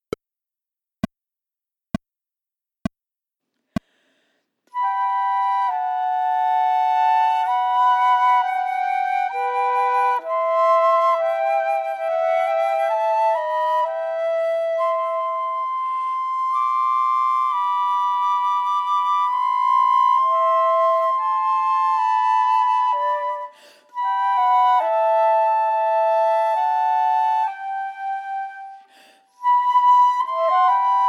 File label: Adagio, Chamber Music for 3 Flutes, minus 3rd part; Corelli